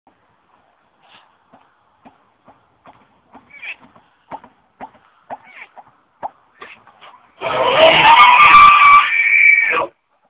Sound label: glunk cowcall bugle scream